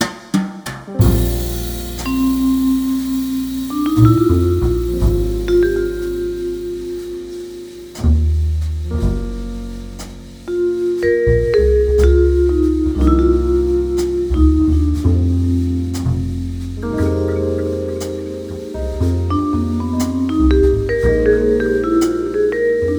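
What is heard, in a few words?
vibraphone cozy night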